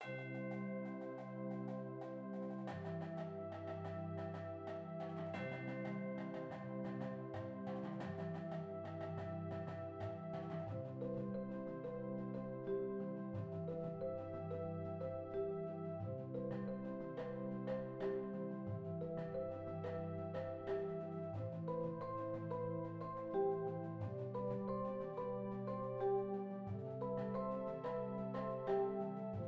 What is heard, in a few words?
marching band fan arrangement